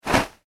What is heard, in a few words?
clothing pile land floor; Clothing - Pile Of Clothes Fall / Hit Floor; foley; Drops; Objects; McKinney Sound